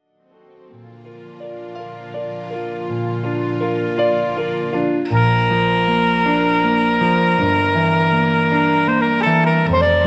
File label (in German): Ave Maria Sopransax